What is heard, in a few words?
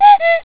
cuckoo, cuckoo clock